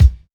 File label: nice kick drum sound b key, bass drum single shot tuned to the B note